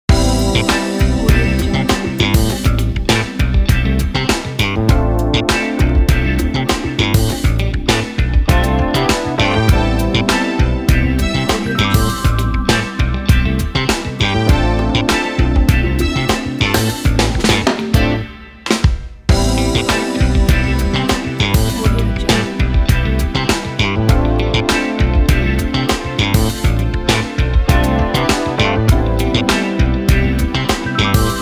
70s glamour; avisailkorotkov

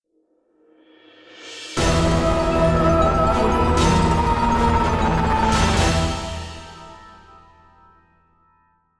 vampire lair stinger

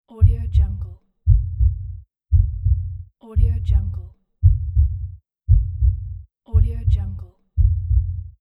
heartbeat

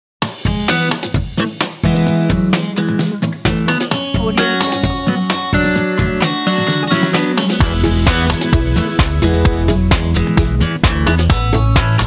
motivation ident

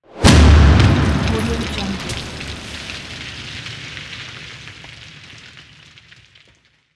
explosion

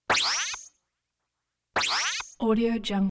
cheesy power on boings multiple variations

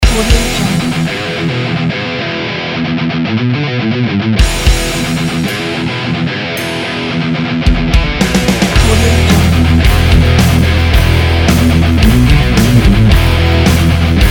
racing rock

